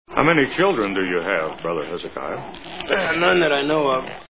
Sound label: children